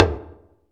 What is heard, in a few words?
Mix Percussion